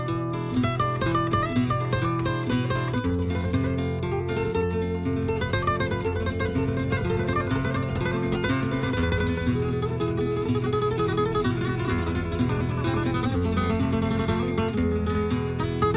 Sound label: Saw Ambient